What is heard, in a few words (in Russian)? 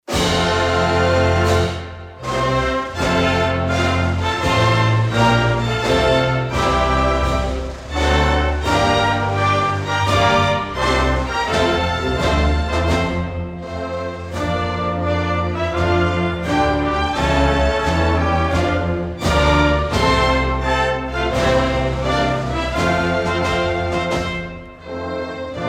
gimn instrumental